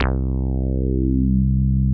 TB 303 BASS

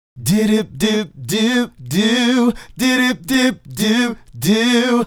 Male Group, Hip-Hop and RnB Vocals

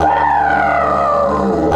140, DIDGE RIFFS

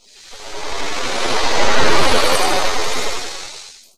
CRUSHER; AKAI Anime Sound FX; SCI FI

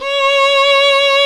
SOLO STRINGS

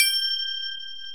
BEL, Orchestral Perc; Roland LCDP03

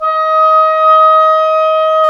WND OBOE3, Oboe Room; Roland LCDP04 Orchestral Winds; Oboe 4-8

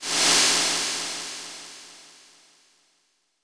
Brush Swirl Long, snares

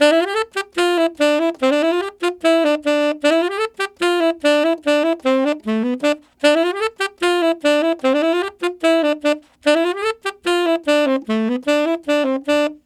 Tenor Polka in D min, Tenor Sax Loops, Jazz; Sonic Foundry (Sony Creative Software); Jazz Solos and Sections

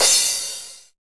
TR-909 Crash; Roland BK-5

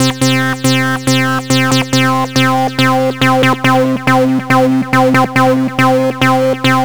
NRG Arp, trance

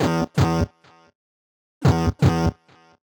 130 E, uk garage, Synths; 130bpm Lines n Loops

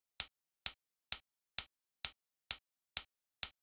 Hi-Hat, EDM; Sonic Foundry (Sony Creative Software); Afterhours EDM; Ibiza Underground

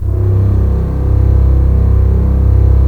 STR, Roland LA Composer; Roland - LA Composer vol. 4; Full Mutes

